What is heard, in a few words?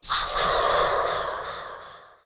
Voodoo Doll Death